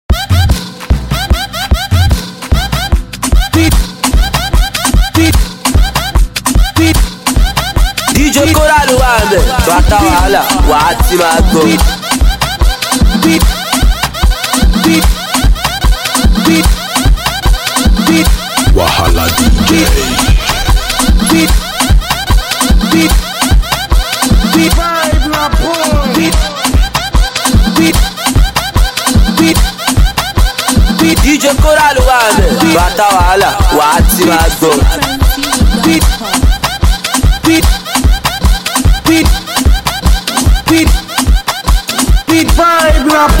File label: Beat